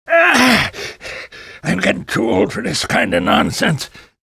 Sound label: fish hook grunt, fisherman; lostcoast